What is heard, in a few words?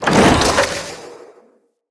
Companion Jump Whoosh, Foley; Pets; Zanuka